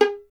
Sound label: STR VLN, Solo Instruments; Miroslav Vitous